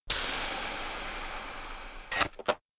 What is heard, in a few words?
overheat, plasma rifle; halo2